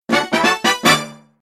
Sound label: Item Get Fanfare; Zelda